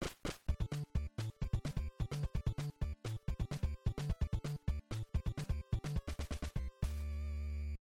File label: area clear; tmnt